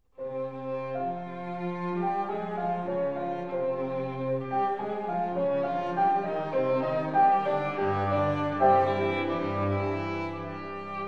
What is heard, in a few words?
piano quintet; brahms